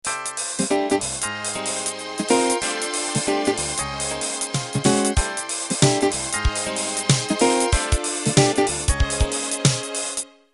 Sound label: Swing Rock Intro